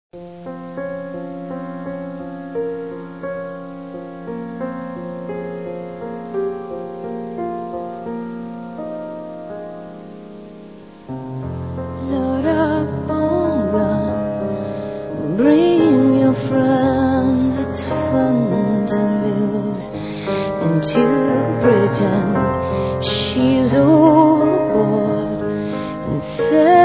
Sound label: piano